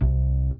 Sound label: Bass Confirm; Levels Hub Swamp 01 Music Ray